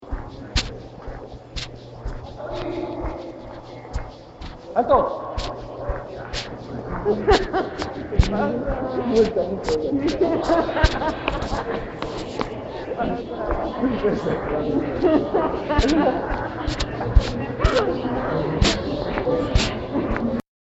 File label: alto